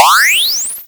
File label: upgrade; Kenney's Sound Pack; Retro Sounds 2